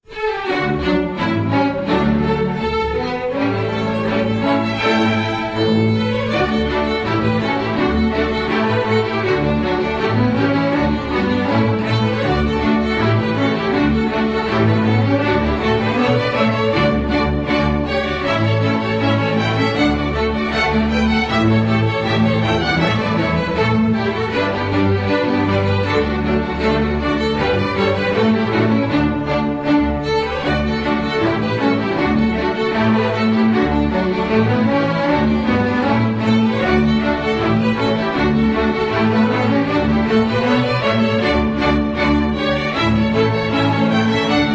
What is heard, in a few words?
hornpipe; wingert jones music